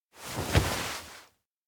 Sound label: plane seat sit; anemoi